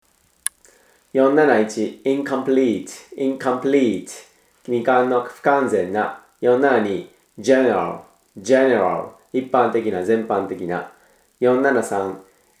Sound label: tango